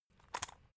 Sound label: salt mill metallic soft; berufe; gastronomie